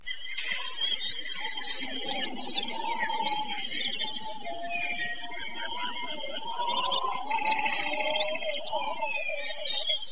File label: jungle